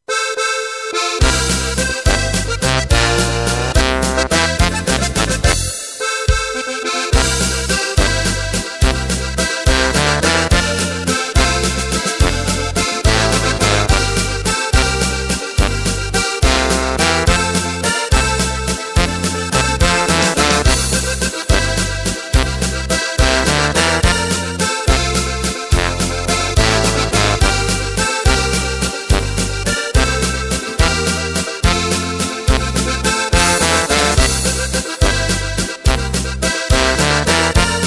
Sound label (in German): Drums, Playback